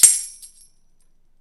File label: KOMMERZDRUMS